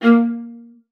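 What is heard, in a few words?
A#3, viola spiccato; Xsample VOL-5; 90 s Sample CDs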